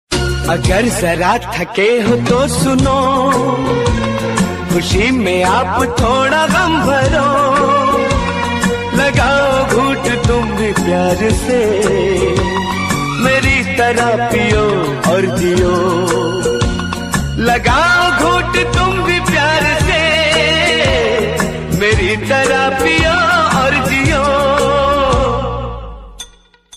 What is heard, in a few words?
Sad Ringtone